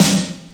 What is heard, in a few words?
sizzly snare sound g key; Mono; snare drum samples